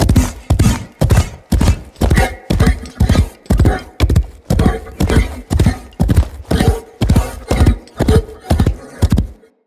Horse Step Gallop Loop; Mendicant Horse; Foley; Footsteps

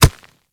Kubrow Step Dirt; Warframe

